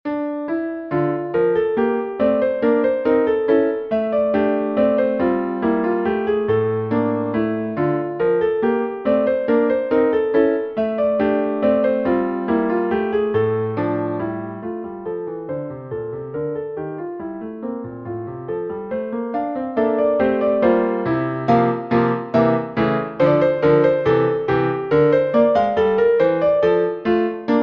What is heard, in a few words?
Gavotte